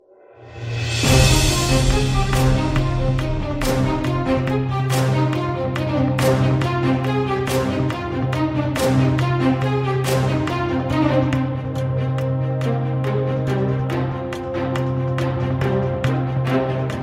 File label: ringtone; babylon berlin